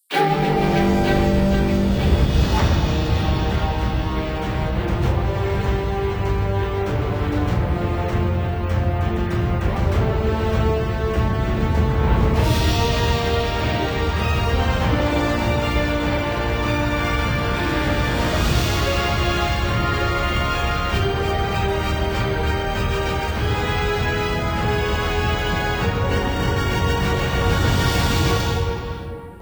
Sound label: Menu, Game Music; Mask of Control; Console Games; Bio Trailer